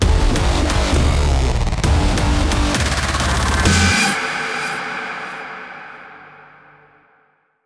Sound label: Round End